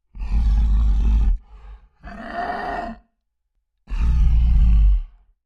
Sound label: Creature Large Breaths Grunty; Scary Breathing; Horror